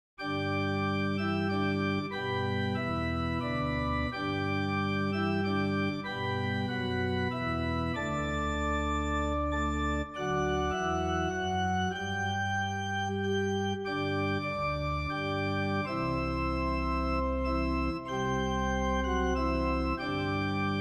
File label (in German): stille nacht orgel; franz xaver gruber